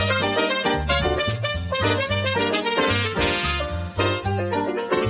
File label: funky sax to piano